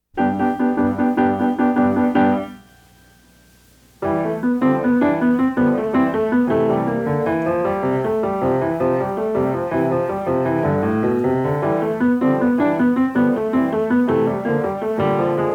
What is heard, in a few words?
Fiesta Piano Solo